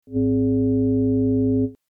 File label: interpretive tonal eval